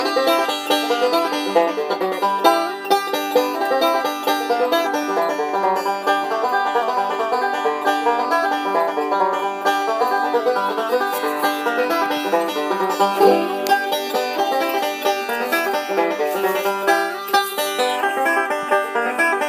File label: Banjo; Turkey Creek KY